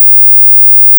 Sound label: 20000Hz; 僕はここまでが限界です。かすかながら高域の音が聞こえました。